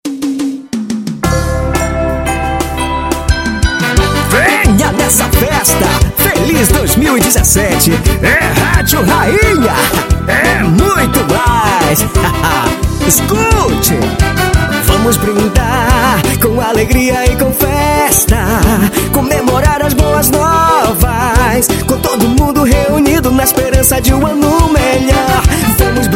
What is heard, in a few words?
Jingle; jingles natalinos